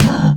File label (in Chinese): 骆驼受伤声